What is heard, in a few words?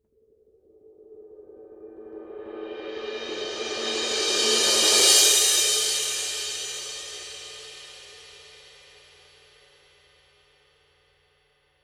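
signature 19 full crash roll, cymbales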